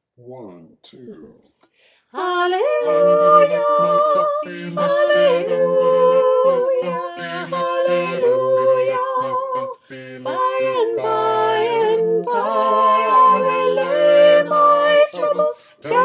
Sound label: halleluja refrain in f soprano